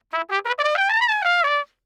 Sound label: Trumpet